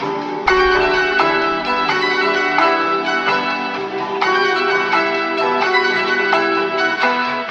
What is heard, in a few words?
keys and voice